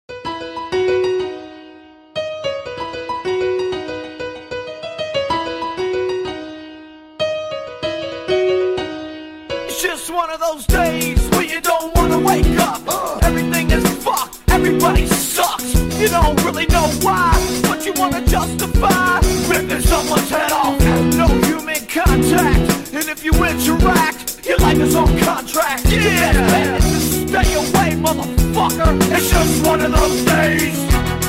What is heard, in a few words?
piano car